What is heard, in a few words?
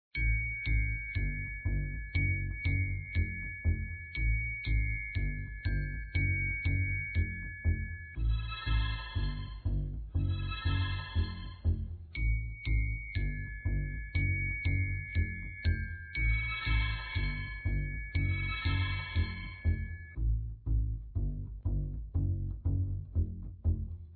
music Loop Menu; lantern jack